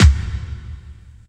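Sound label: reverse bass drum, Drum & Bass; Ueberschall; Drum & Bass Resonance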